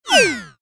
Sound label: DIGITAL DESCEND; Multimedia; Sound Design; Blastwave